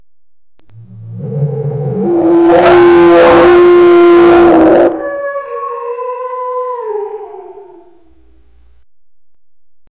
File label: dinosaur roar loud bellow